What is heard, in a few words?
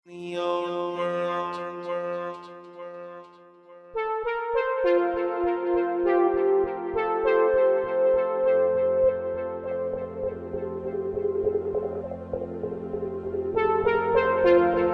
Neonworld Synth Session Mix